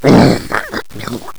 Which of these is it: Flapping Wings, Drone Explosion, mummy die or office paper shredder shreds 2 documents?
mummy die